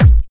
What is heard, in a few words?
Kick